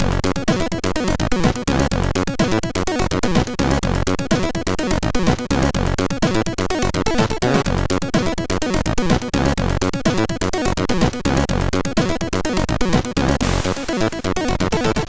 Powerful, sid MOS6581R3

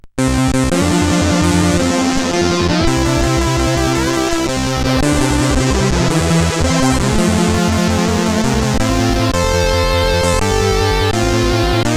Happy Birthday sid CSG8580R5; hvsc

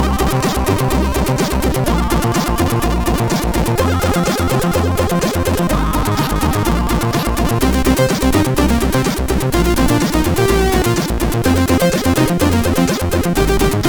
Brutal 4 note, MOS6581R4; hvsc

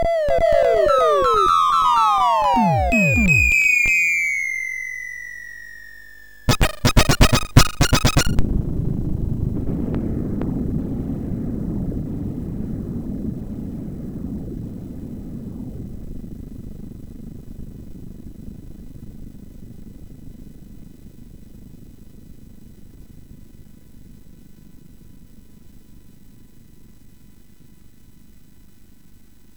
end, MOS6581; MOS6581R4

